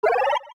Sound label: Item Appear Light